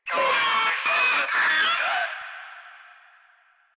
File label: Runner Vocal Attack; Enemies; Warframe; Proto Infested